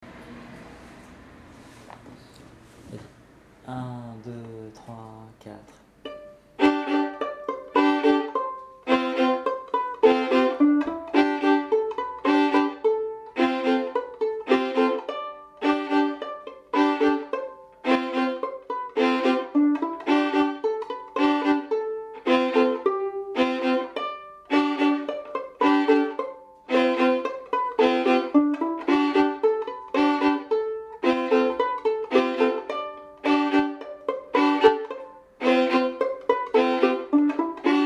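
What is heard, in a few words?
scottisch accordion; scottisch du 29 mars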